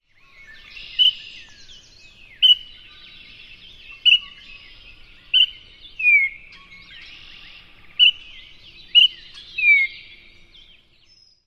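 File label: two note calls and simple whistles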